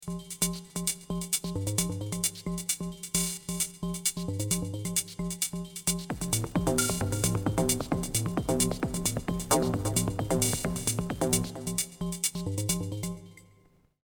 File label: digitone snap